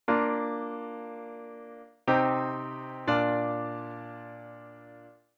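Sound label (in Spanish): Cadencia Plagal Ii a I, Bb; auditivo de cadencias autenticas plagales rotas y semicadencias; Identifica la cadencia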